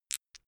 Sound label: dice clatter